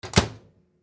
Microwave Close, Microwave Close Door; Household; Appliance; Microwave Doors; Sound Effects:Doors

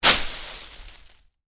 Kick leaves, Shoes: sneakers, kick pile of leaves; Miscellaneous Impact; Stuart Duffield

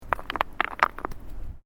Bricks Movement Minor

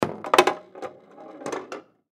Foosball Table; Foosball Table Goal Ball Roll Slow; Games; Sports

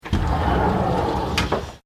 Window Sliding Large Aluminium Open

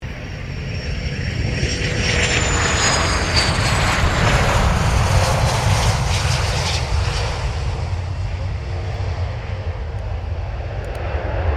AIRCRAFT GULFSTREAM G450 LANDING, Corporate Jet; Airplanes (Sound Effects:Vehicles)

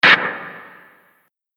Short Distorted Hit; Impact / Explosion Sound Effect

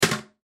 Toilet Seat Lid, Toilet Seat Lid Drop Bathroom; Household; Blastwave

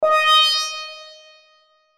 Chime Musical, Chime Musical Multimedia; Synth; Multimedia Web Interactive; Chimes; Blastwave

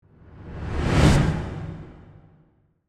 Whoosh Suction, Fast, Airy, Dark; Whoosh Sound Effects; BlastWave

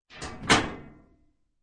Clothes washer is forcefully opened; Open Washer Angrily; Laundry; Household

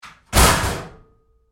Door Locker Close Roomy Hard, Metal Door; Metal Doors

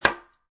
Foley, Aerosol Can Set Down On Table; Set Downs; Objects

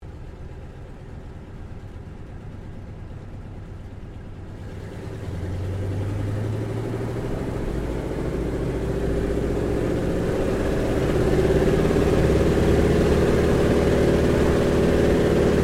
AIRCRAFT CA-28 CERES TAKEOFF INTERIOR; Airplane; Vehicles

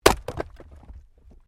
Wood Chopping Axe; Objects

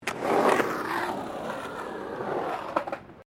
SKATEBOARD SKATE BOWL THROUGH BOWL; Skateboard sounds; Skateboarding (Sound Effects:Sports)

